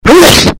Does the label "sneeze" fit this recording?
Yes